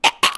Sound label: gas gasp, smoker, voice, idle; Left 4 Dead 2